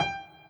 piano

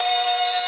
synth main

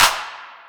CLAP Drop Out Reverb

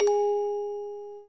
orgel

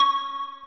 Arp; osu

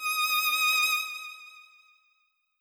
violin

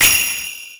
japan perc

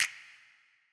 snap

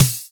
drum hit clap

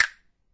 soft hit normal

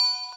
Bells

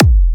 drum hit clap; osu

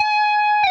Left-hand vibrato

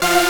synth; osu

